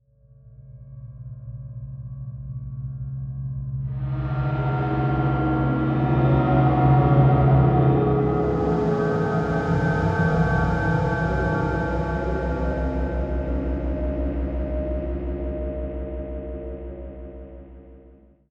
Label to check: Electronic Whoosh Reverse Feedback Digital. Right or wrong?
Right